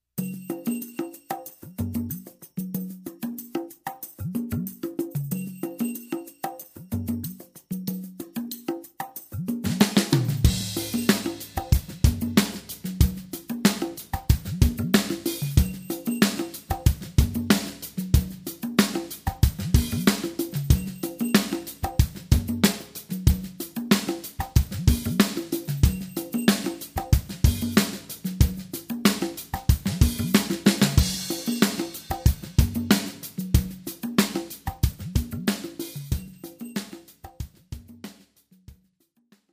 Grooving with Loops

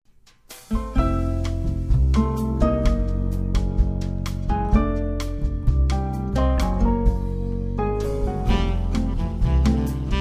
Jazz Standards

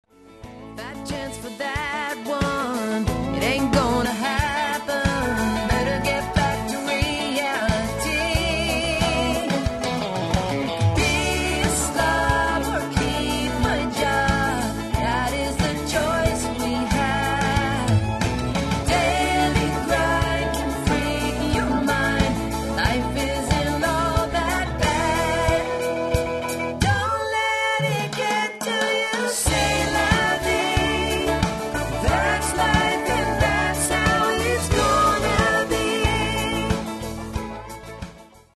with band

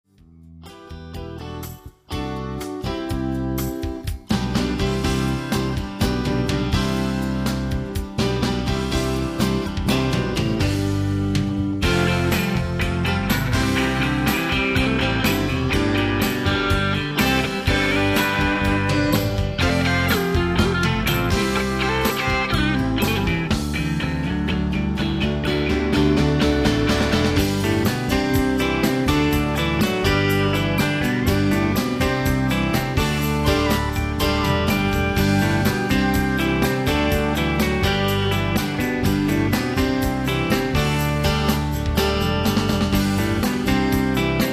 Country Pop

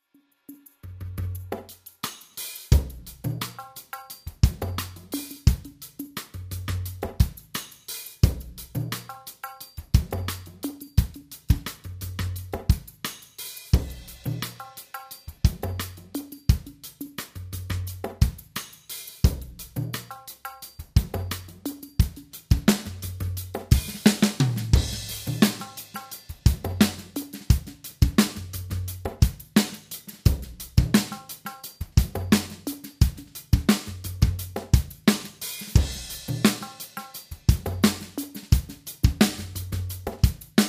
Grooving with Loops

